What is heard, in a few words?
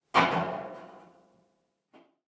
ambient soundscape underground; Soundscape Overhaul